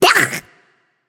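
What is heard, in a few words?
nonverbal clear throat; smite; voicepack; izanami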